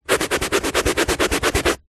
household brush scrub carpet; zapsplat

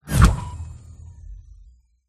Impacts and Hits Ultrasonic Kick and Swish

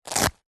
clothing bum bag belt velcro rip; zapsplat

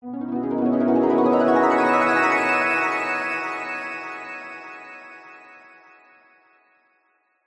sound design magical rising twinkling nostalgic

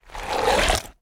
large rock lift movement beach rockpool; foley

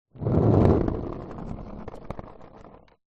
skateboard plastic short roll on street; sport

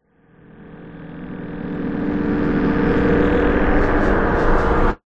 magical portal open; zapsplat

